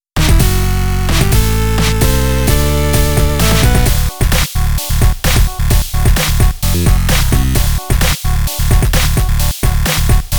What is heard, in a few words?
Chiptune; Super Lesbian Horse RPG OST; SLHRPG Music Team